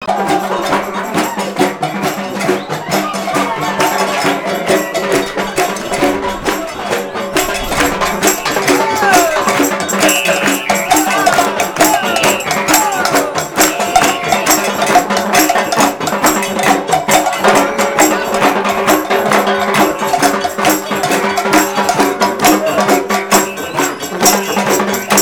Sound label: steeldrum; best service Blue Box